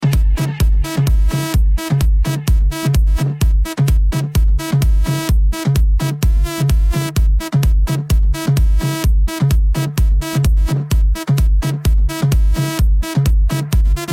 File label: Moombah